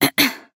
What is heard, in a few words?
Nonverbal Awkward; Aurora